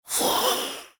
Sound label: Zombie